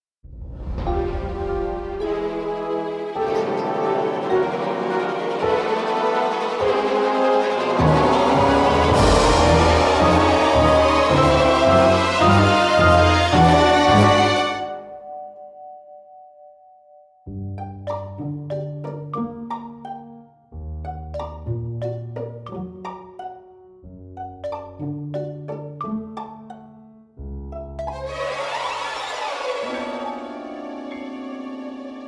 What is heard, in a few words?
horror